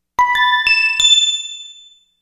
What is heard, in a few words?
Bell; Sonic Foundry (Sony Creative Software); Infinite Soul VOL-1; Bells